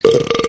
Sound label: cartoon burp